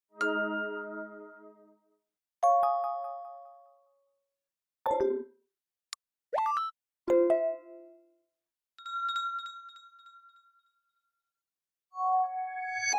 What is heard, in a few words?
Smartphone UI Sound